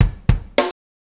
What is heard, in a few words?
Boom Boom Smak, Drum Tools; Sonic Foundry (Sony Creative Software); Loops & Samples Drum Tools